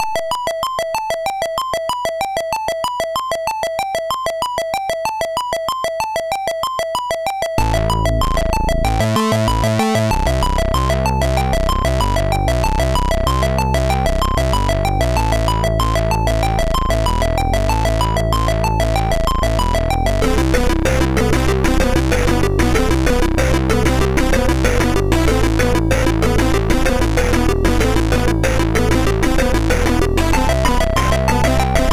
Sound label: Unused Ingame, MOS6581R2